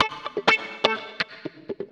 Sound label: 125 d, guitar licks; house; Vocal House; PS-28 Vocal House Samples; instrumentloops